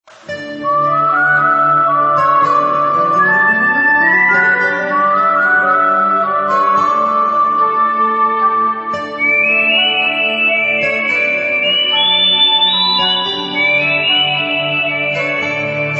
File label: romantic sad track